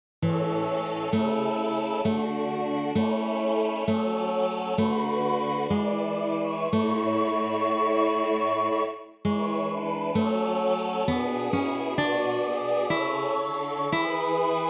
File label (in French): ps ténor; Psaume 5